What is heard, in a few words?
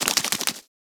Foley Idle Head Shake; Enemies; Warframe; Catbrow